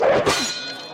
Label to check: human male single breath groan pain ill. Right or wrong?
Wrong